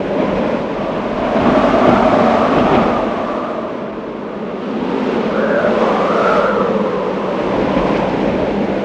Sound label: wind loop mountain